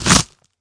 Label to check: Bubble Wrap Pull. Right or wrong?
Right